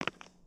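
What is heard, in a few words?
Foot Concrete Sneaker Walk, footsteps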